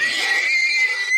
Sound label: minecraft horse death